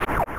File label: Wave Beam; Metroid (USA)